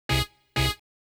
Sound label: Horn